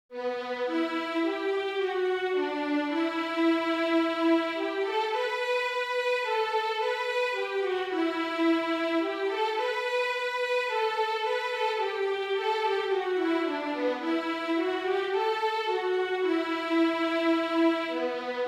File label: Rounds Set Two Irish Tune